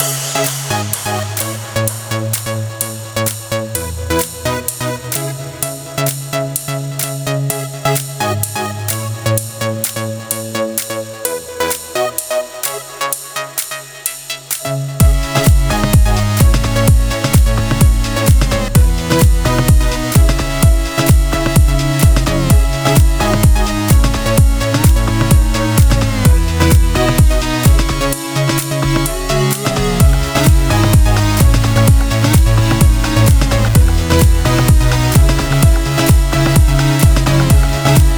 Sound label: FULL MIX, Big Room House, Key A, Bpm 128; Loop Nerds; Big Room House Allstarz